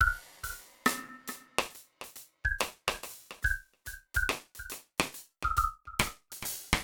Dub Loop, Drums & Percussion, Electronic; Sonic Foundry (Sony Creative Software) - Electronic Point Blank

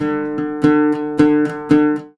LUTH; USB Soundscan vol.43 - ArabianTraditions; AKAI

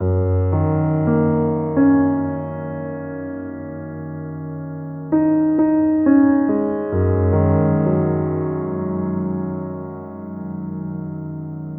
Piano; Sonic Foundry (Sony Creative Software); Slow Silhouette

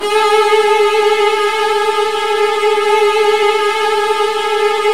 TRILLS, Virtuoso Strings; ILIO; Kirk Hunter Virtuoso Strings VOL-2

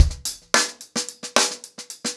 Hip Hop 110BPM, acoustic drums drumloops

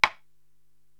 Taiko Rim, Korg TR-Rack

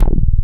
low end bass; Dance; BASS SOUNDS; Masterbits; Dance Trax

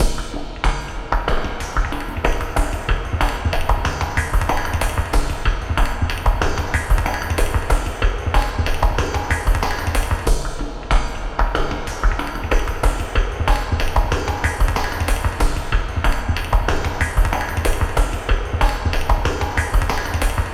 SPACE STATION, SLOW MELTING GROOVES

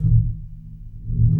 JUG HIT

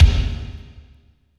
KICK, Tekno Industrial; East West

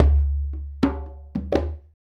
Djembe; Sonic Foundry (Sony Creative Software); Essential Percussion; Drums

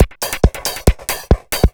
drum loops, Techno, 138; Best Service ProSamples vol.45 - Techno ID; xtra-drumloops

